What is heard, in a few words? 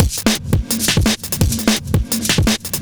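Drum and Bass Beat 170, drumnbass 170bpm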